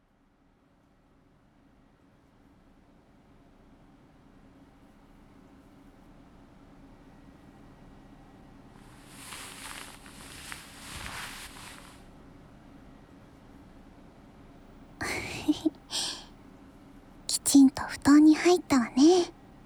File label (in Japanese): 子守歌・おやすみトントン, ASMR; しっかり休みましょう